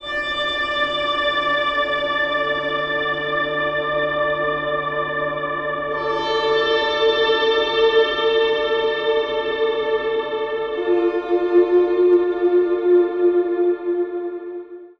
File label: sitar, ringtones; dustinwells